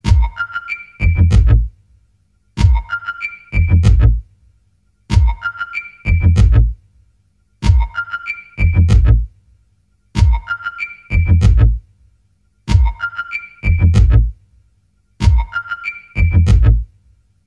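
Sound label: mechanical pianos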